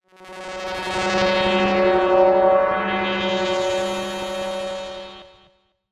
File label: sound design alien drone whine delayed panned